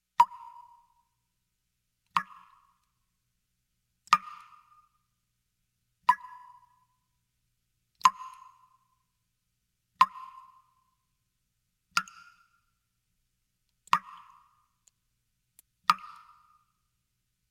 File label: Blood Dripping; Halloween Havoc - Sound Effects; Ktel